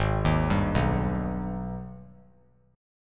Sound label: G sharp Minor Major 7th G sharp down; arpeggiated; JGuitar